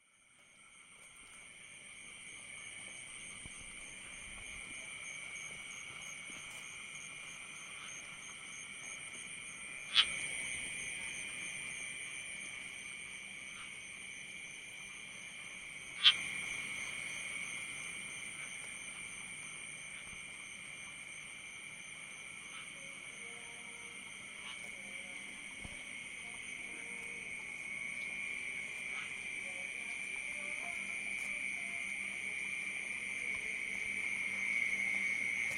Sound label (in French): insectes et crapauds dans la nuit; Ubud